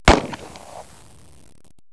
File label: GUN